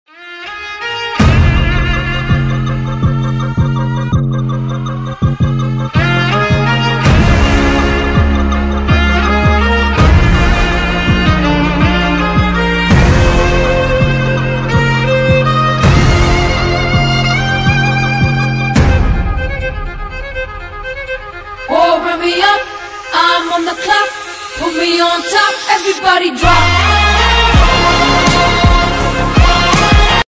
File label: theme ringtone bgm; kalki